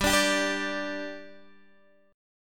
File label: G-5th-G-x,10,12,12,10,12 down Guitar DADGAD